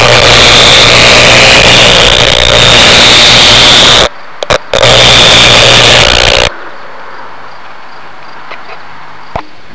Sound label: Powerline noise M330 speaker recording; locator audio; RFI